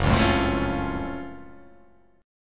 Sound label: F sharp Minor Major 7th F sharp